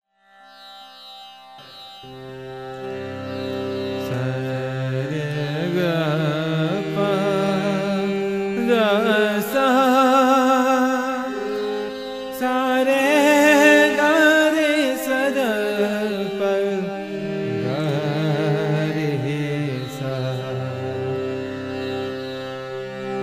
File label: katyayani, raga